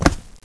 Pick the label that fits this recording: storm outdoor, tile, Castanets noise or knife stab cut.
tile